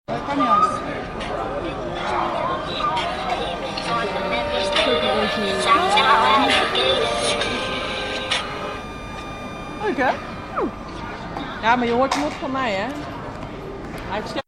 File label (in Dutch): muziek in het station; s Hertogenbosch